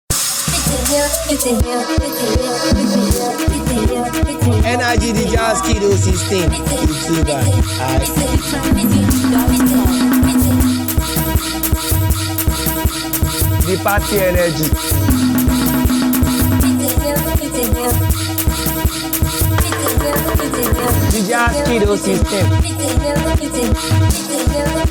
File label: Beat